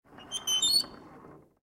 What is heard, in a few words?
Pub Door